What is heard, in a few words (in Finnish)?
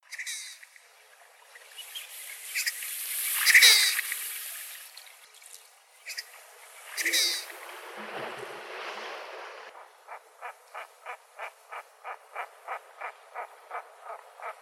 tukkakoskelo